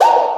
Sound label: whoop, Misc Percussion, Pop